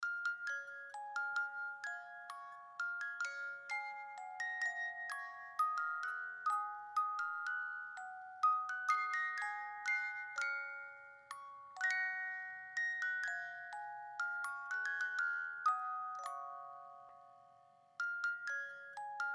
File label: Baby Mobile Brahms Lullaby Music Box; Miscellaneous Musical; Blastwave